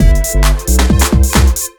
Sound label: 2 Step 133 MIX, House; USB Soundscan vol.51; House Side Of 2 Step; AKAI